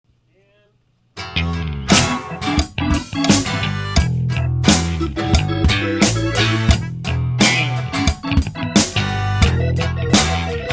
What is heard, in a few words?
slow funk